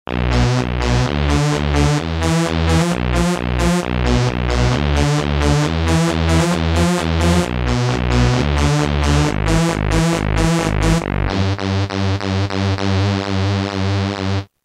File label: Common Synth; Roland M-DC1